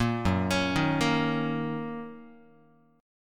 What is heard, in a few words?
Gb 6th Gb down Guitar; Gb6 arpeggiated; JGuitar